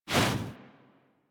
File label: quick foley; characters; generic foley; Warframe